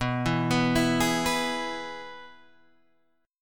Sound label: Ab Minor Sharp 5th B